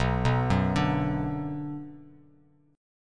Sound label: B Minor B Bass Standard; arpeggiated; JGuitar